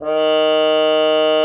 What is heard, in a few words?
CPZU D#3, CUP MUTE; Xsample VOL-3